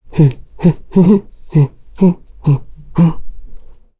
Vox Hum; Chrisha; kings raid; en

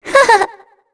Laugh, Vox; en; voices; heroes; Shea; kings raid

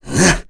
Vox Jump; KaselB; kingsraid

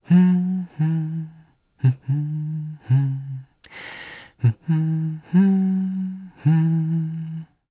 Hum, jp; kingsraid; voices heroes jp

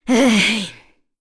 Vox Sigh; Cecilia; Kings Raid

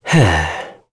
Vox Sigh; kingsraid; en

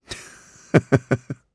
Vox Laugh jp; Lusikiel; kings raid

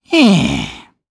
Vox Sigh, jp; Bernheim; kingsraid

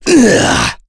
Attack, Vox; en; voices; heroes; KaselB; kings raid

